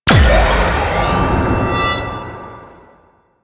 Heavy Slam Ability Charge Up; Warframe; Duviri; Dax

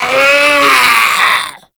Infested Runner Attack Call; Warframe; Runners

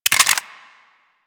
Burst Pistol Clip Out; Warframe; DE; Weapons

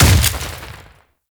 Ice Proj Hit A; Warframe; Corpus; Arachnoid Camper Terra; Abilities

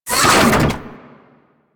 Door Close; Warframe; Ambience

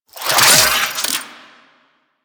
Necramech Repair Drone Claw Spin; Warframe

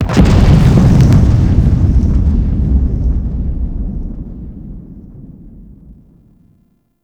Infested Micro Planet Wyrm Death Fall; Warframe; Microplanet Sun Monster

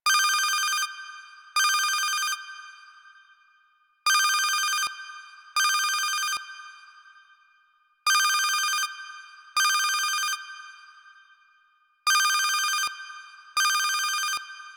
Digital Phone Ring Loop; Warframe; Ambience; Nineteen Ninety Nine

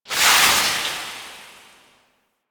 Projectile Death Small; Weapons; Warframe; Duviri; Incarnon Adapters

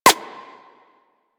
Bullet Impact Generic; Surface

